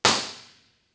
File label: Small Room ImpResp